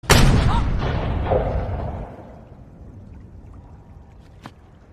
pirate flintlock

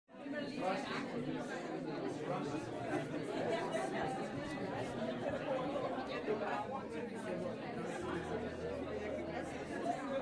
crowd noise

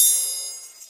triangle

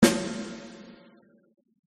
drum

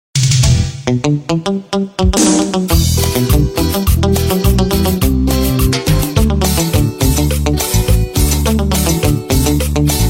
kick

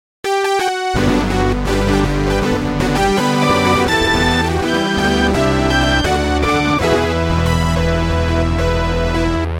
jingle; cinar

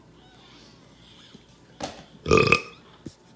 burp sound

